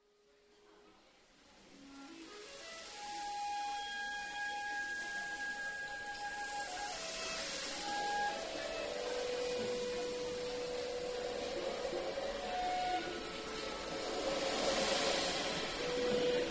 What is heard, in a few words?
Ocean